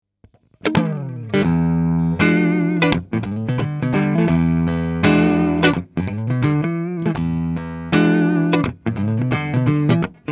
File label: Clean Neck PU Humbucker